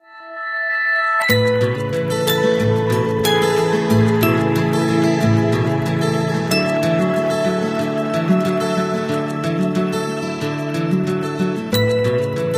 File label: Guitar, ringtones; Honor MagicOS 7.1